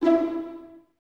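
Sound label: violins E4 pizzicato, String Ensembles; Miroslav Vitous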